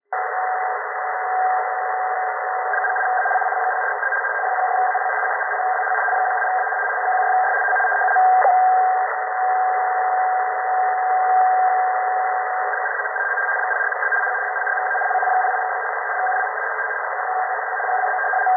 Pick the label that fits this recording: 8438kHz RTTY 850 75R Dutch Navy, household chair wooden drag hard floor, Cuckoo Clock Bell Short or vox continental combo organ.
8438kHz RTTY 850 75R Dutch Navy